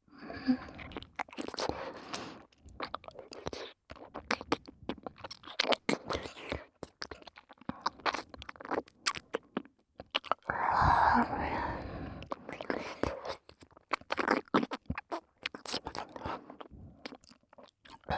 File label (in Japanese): エロ吐息多めの密着耳舐め【右耳】ループトラック, ASMR; 高身長バレー部むちむち爆乳彼女との、真夏の蒸し蒸し汗だく溺愛搾精えっち